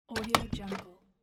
old exterior door handle press; domestic sounds

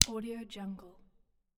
drumsticks hit; ضربه به طبل

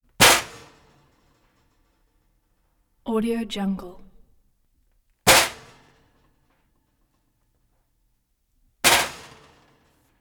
metal hit stove; اجاق گاز فلزی; industrial sounds

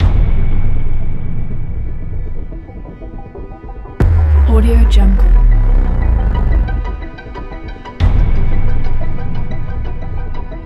horror trailer tension serious thriller; ترسناک، پرتنش; موسیقی بی کلام

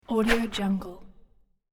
transition paper page turn; transitions and movement; ترنزیشن چرخش صفحه کاغذ

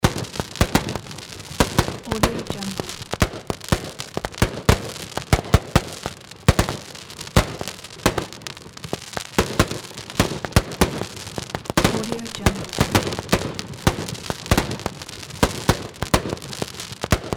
small fireworks show; urban sounds

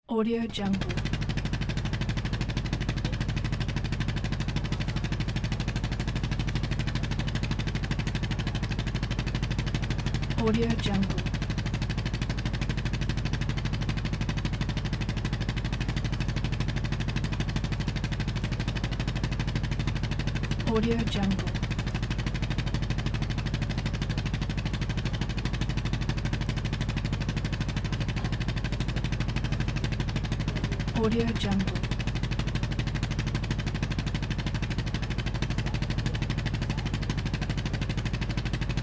motorboat engine idling; urban sounds

